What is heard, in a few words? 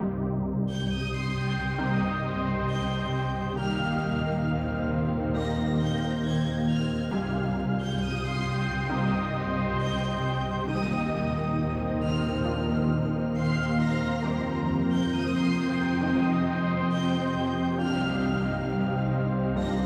Heart Noises 135 BPM Cm